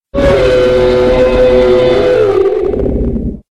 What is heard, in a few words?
Female Cry